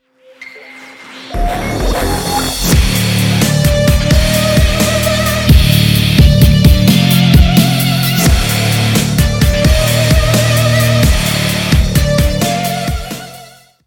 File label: Guitar Tone; Crafting